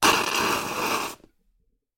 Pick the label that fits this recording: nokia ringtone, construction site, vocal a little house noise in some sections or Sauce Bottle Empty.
Sauce Bottle Empty